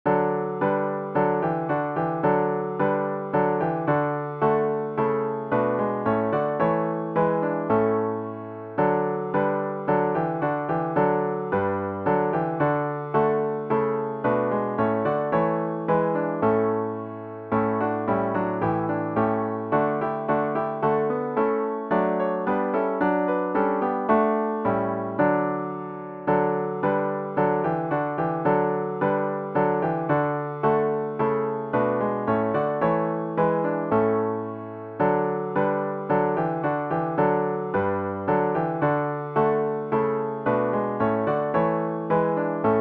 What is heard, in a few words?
piano only; Lord Dismiss Us with Your Blessing